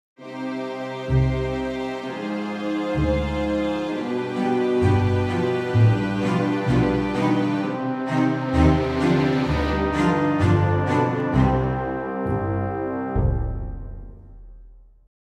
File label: Defeat; battalion wars